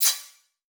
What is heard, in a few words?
Multimedia Internet CD-Rom Flash Pop Up Short